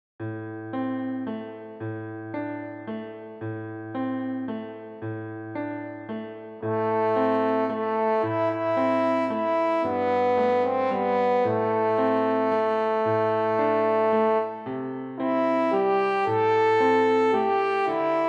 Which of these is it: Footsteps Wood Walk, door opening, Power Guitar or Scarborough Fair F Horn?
Scarborough Fair F Horn